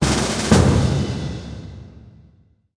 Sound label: player invite; wow